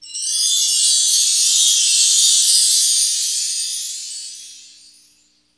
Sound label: Hip Hop and R&B Drumloops